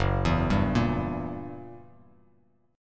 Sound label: Gb 7th Flat 9th, Bass Standard; arpeggiated; JGuitar